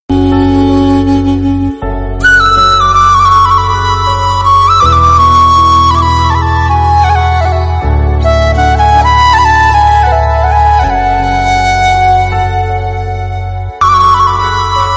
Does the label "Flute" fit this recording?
Yes